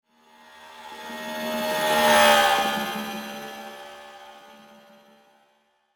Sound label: Spaceship Pass By, Scifi, Spaceship, Space, Age, Motorcycle, Pass, By, Science, Fiction; Spacecraft; BlastWave